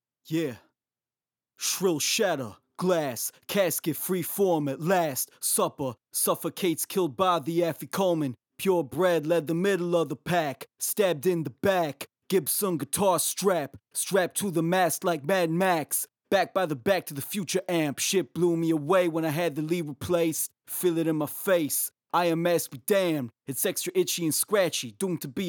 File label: Vocal Recording Sample Mic wet